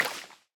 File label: step wet grass; minecraft